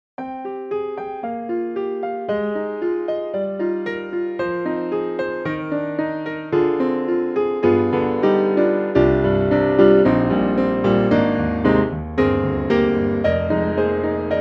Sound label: Grand Close Mic